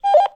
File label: radio off